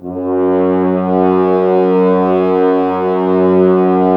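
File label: brass, French Horns; Sample CDs; Miroslav Vitous; Woodwind Brass Ensemble; F.Hrns Links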